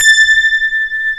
ROCK STRAT; E-MU Formula 4000 Series Vol. 3; Analog Odyssey

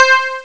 Tea Piano, Amiga Soundtracker; ST-XX Amiga Soundtracker Sample Packs

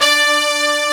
BRS D3, BRASS SECTION; AKAI S6000 CD-ROM - Volume 1; BrassWind

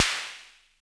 Snare, Univox SR-95

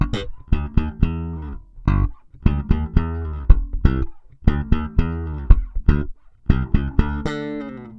SM BS G, BASS SAMBA

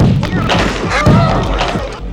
Thumpy Beast

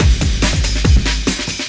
Beat, Drums & Percussion; Electrocution; Beats

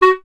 clarinet F#, Solo Instruments; Miroslav Vitous - Solo Instruments VOL-1; 90 s Sample CDs

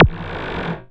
BLIP ROOM, Anime Sound FX, SCIFI; AKAI Anime Sound FX

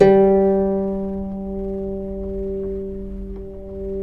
CEL. HARP G2; USB Soundscan vol.23; Historical Instruments; AKAI

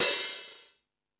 Metal Drums, E-MU Pro-Cussion; E-MU Pro-Cussion-1

